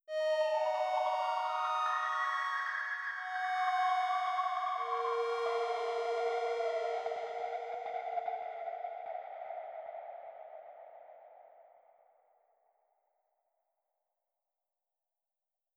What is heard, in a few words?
Noise FX Ambient; Jumbly